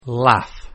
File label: laugh